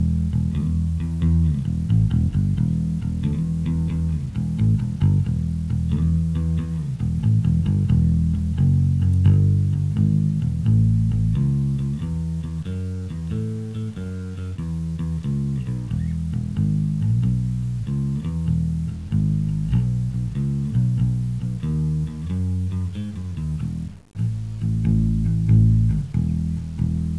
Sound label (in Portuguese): BAIXO; INSTRUM